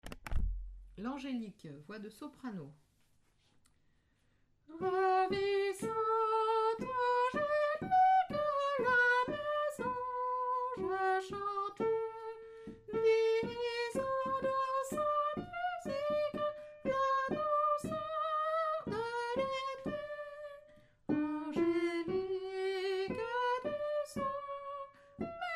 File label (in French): l'angelique soprano